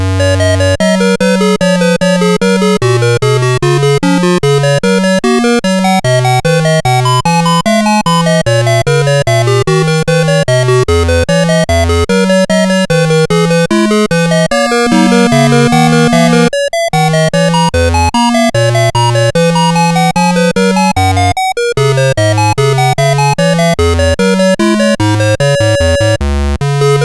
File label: Toccata, CSG8580R5